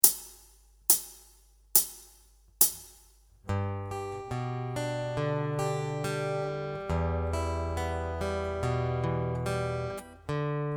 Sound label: Chord Pick Mix